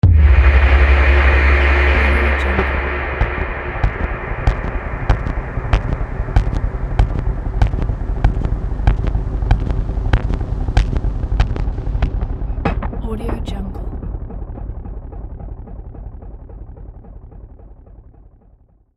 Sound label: sub noise impact